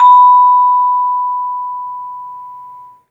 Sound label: vibes; 3D Sound; 3D Keys VOL-1